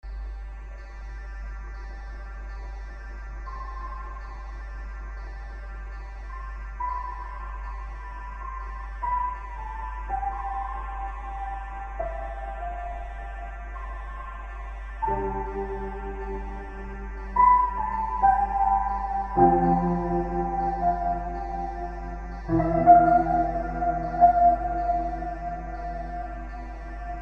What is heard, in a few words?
Drunken Piano, dark ambient